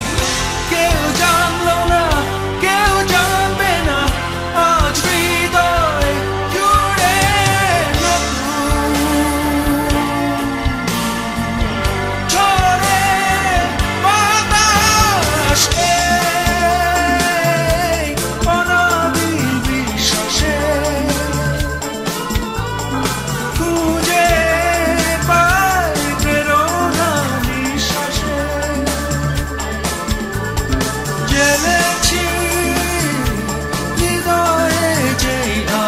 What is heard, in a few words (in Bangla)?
Bangla Song